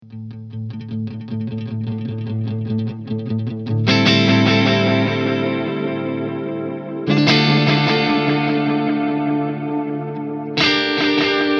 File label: hx cosmo delay